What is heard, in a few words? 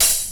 short open hi hat one shot C key